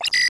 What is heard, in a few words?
objective notification; Tribes2; t2-mapper